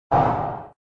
Ice Spawn

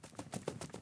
fast run; Five Nights at Freddy's; FNAF 4

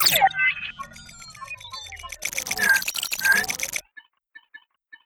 Panel Weather Start; Among Us

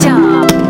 Item start

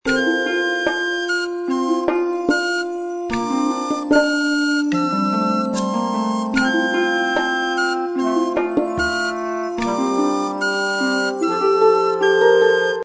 Success; animal jam classic ost linux macos windows gamerip 2010; Turning The Tide

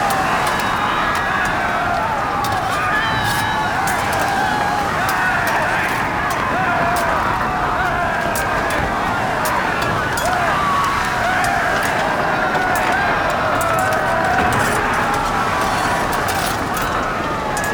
Ambient

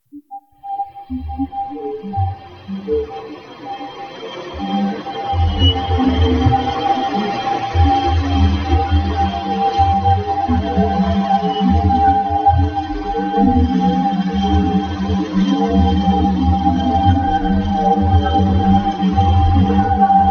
Holy Shimmer

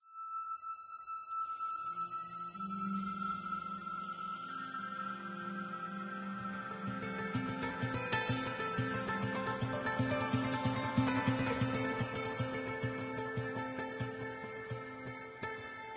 Harbour Sting; ether one redux gamerip 2015

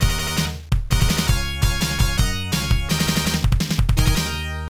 Jungle Win; Dungeons & Dragons Warriors of the Eternal Sun; Genesis

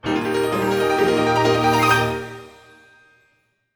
Drill Jingle Full Complete; Dr Kawashima's Brain Training for Nintendo Switch

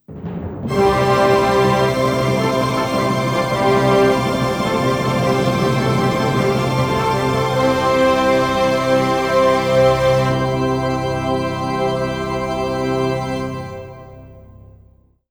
High Score; Chicken Shoot; Wii

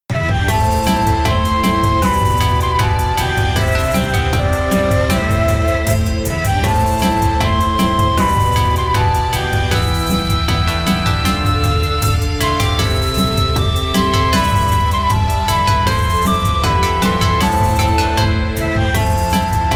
BGM

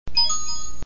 Coin Jingle; Super Mario 63

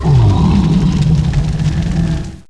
die boss Burning Dead

